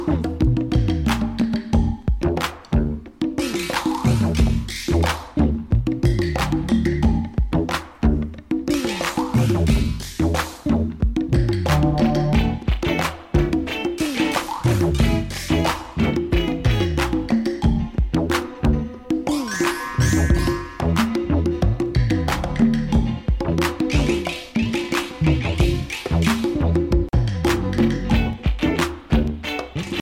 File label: Beat Bop Instrumental